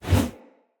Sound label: Player Torch Whoosh; Weapons; Blunt